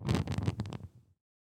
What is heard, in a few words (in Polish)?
zapalanie swiec